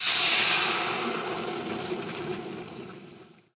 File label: sizzle; VintageStory; survival